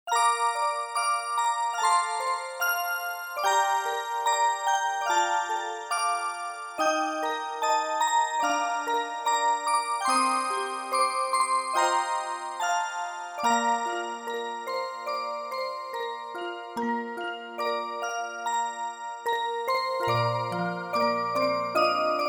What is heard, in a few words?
Piece Adventure orgel